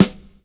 snare drum sound g key, Royality free steel snare drum sound tuned to the G note. Loudest frequency: 1129Hz